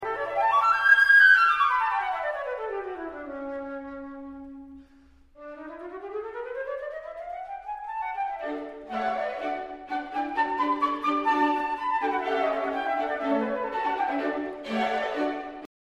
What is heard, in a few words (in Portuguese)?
flauta; instrumentos